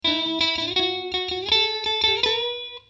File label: strat